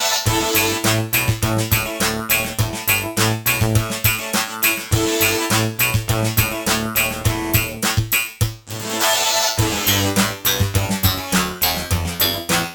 VCO karplus; SDS VCO

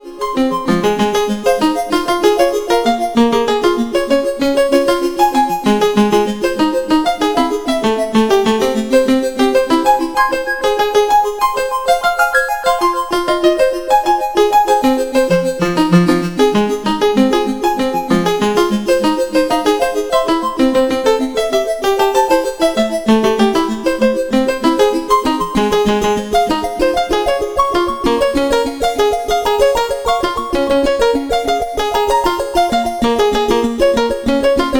VCO And MIDI

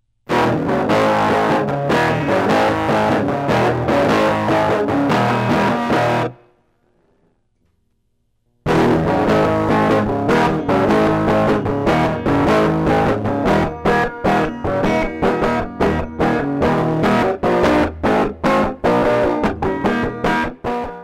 mono stereo

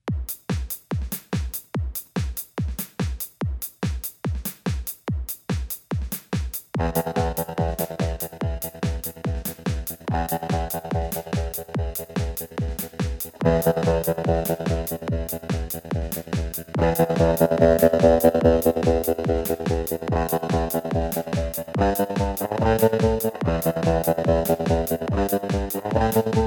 arp

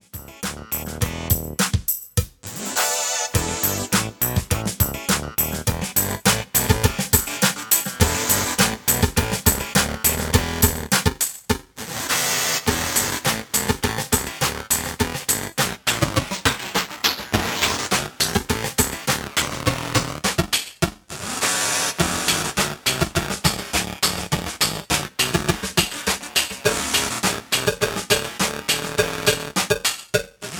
VCO Stutter Raw; The second half of the sample is a simple phasing test.; SDS VCO

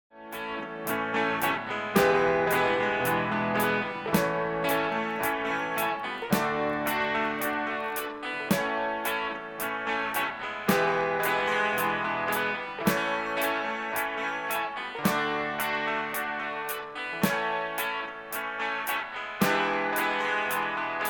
EQ no bass

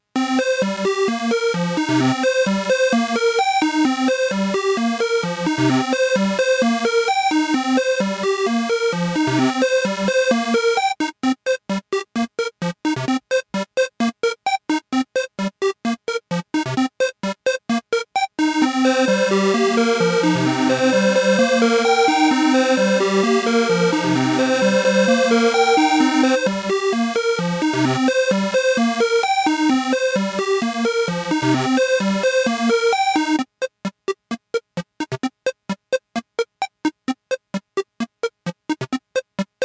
FX stretch

